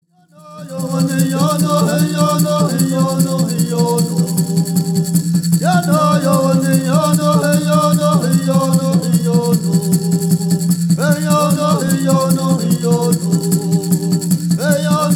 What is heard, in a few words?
Straight Song